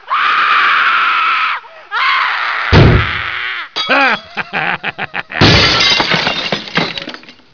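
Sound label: woman scream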